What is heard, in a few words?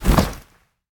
minion bodyfall; smite; ahpuch skin10